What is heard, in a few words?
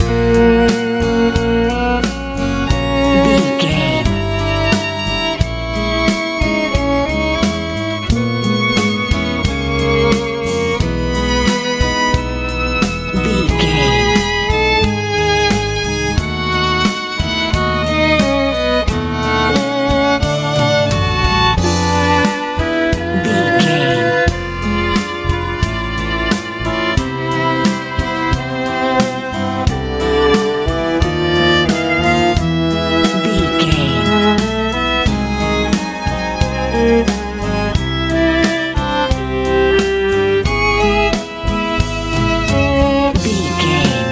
classical pop